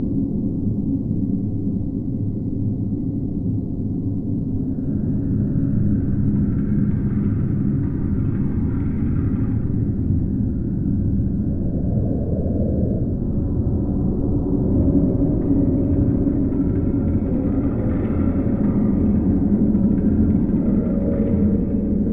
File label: Indoor Ambience; Journey